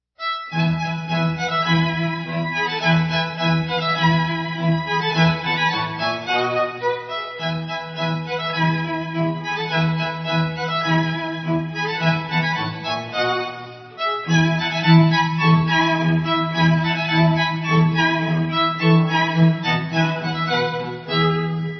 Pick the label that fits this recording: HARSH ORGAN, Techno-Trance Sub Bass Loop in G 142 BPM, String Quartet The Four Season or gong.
String Quartet The Four Season